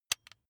electric button drill direction switch backward soft